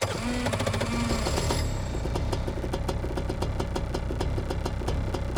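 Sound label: KART interior STARTUP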